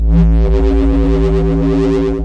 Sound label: Evil Bass Sounds, bass hit; multisampled; Desecrated Productions